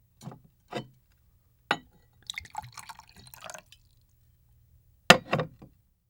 Bottle Up Pour Down; Fairlight Sound Lib

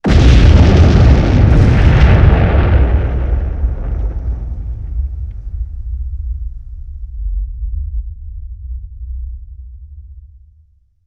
Explosion Large 2x Low Rumble

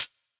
Close Hi Hat, dubstep; Ghosthack; Demolition; Free Dubstep Samples; Drum Shots; Venomous

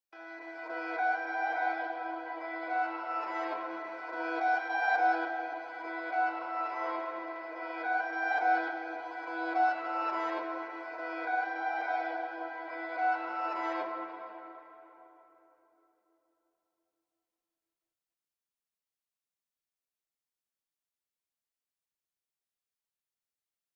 140 BPM E Min Reverse Piano; Cymatics; Spitfire; 2022 Melody Collection; Drill

